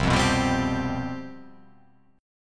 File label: C Major 7th Suspended 4th, down